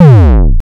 Star Instruments Synare PS-1

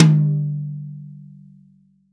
TOM, REAL DRUMS, SWING KIT

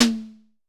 Drum X Snare; Pearl Drum-X

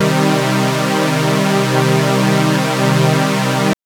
Synth SawPad min c2; TrashLibrary

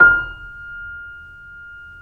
KEY, YC7; Rhythm Section

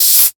Fizzy Water, household rhythm samples Hits

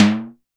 SIMMONS SD-5 drums

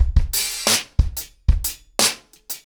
90BPM, ACOUSTIC DRUMS

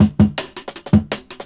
Jungle Breaks

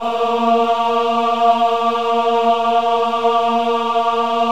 VIB A#3, VIBRATO, Voices; Spectrasonics

